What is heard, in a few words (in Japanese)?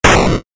ダメージを与える・受ける レトロ モノラル